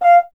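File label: French Horn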